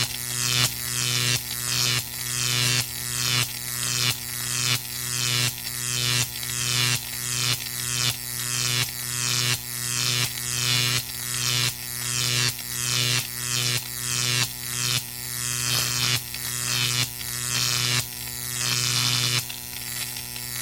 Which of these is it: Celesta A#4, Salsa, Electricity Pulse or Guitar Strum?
Electricity Pulse